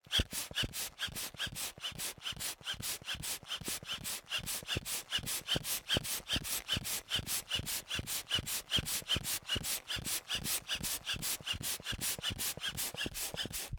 Handbellows, leather, wood, brass, pumping, wheezing, blowing, supple; Eiravaein Works; Vaeyan IV